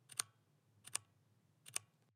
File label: clear switch keystrokes